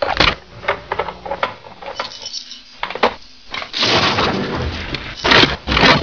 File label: minigun reload